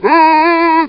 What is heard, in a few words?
zombie voice idle; csgo